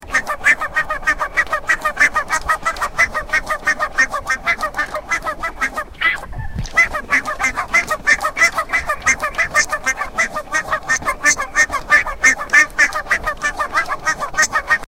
sound effect of duck; birds

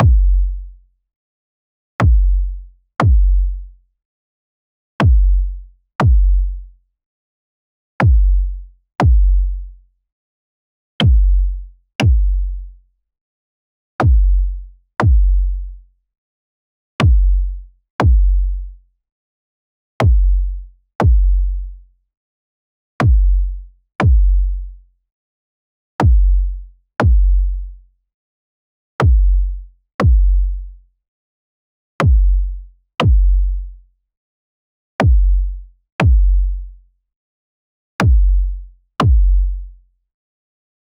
kick with wa, club

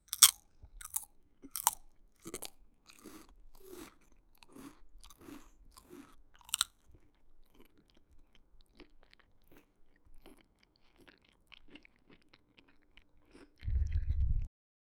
muffled chewing and light; friends

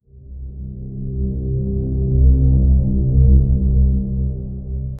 deep suspense for a docum

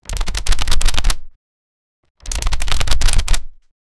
rapid page flips sudden w

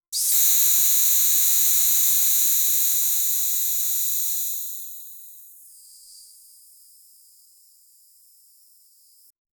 compressed air

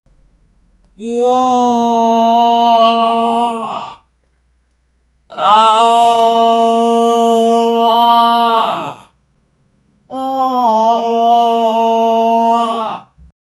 ahhh ahhhh ah ah, vocalization

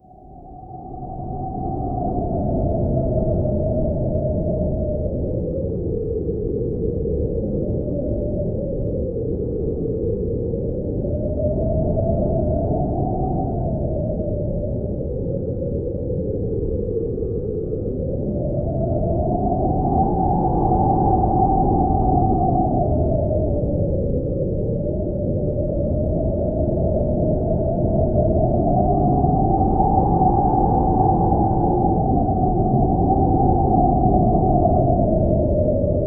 create an ethereal sounds, invisible

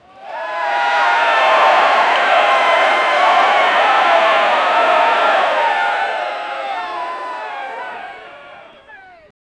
very large crowd in an arena; very large crowd in an arena counting, "10, 9, 8, 7, 6, 5, 4, 3, 2, 1"

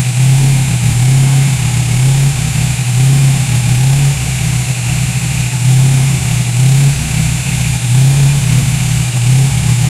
loop car engine sound mor

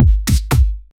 trippy inter dimensional

